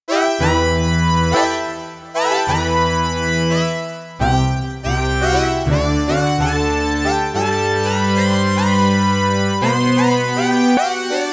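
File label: lead syn voice; the transmitter soundset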